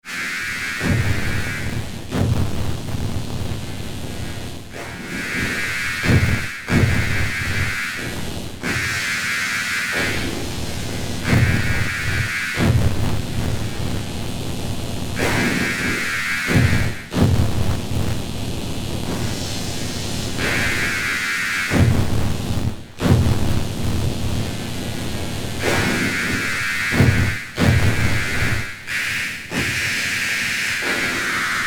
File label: INDUSTRIAL CHORUS